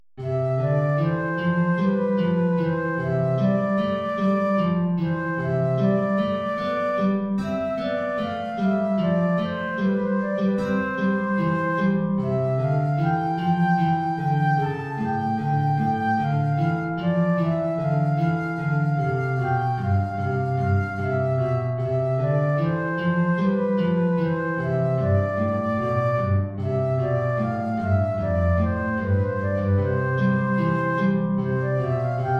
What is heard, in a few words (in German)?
Arie (Querflöte & Gitarre); BWV 212-4